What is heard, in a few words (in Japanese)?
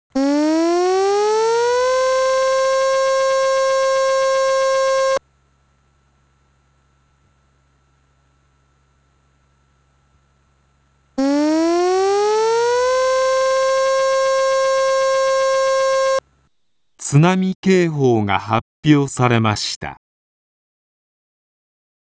tsunami keihou